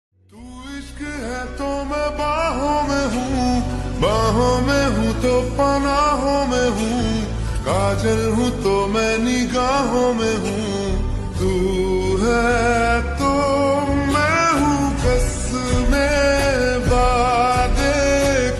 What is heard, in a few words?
Slowed Reverb Ringtone